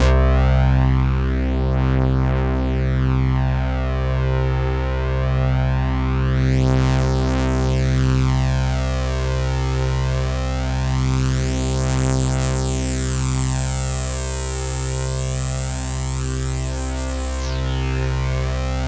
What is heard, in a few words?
POLY SAW, Roland JV 2080, C2